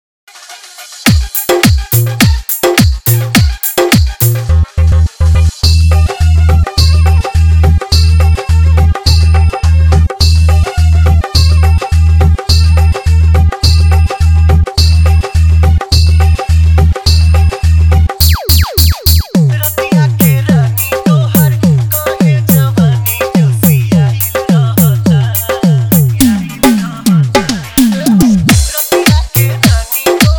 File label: Hi Fi Beat Vibrate Dholki Mix; JBL Sound